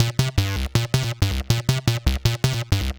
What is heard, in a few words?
gro 160, Hardcore Loops, Bass Loops; Dance Synths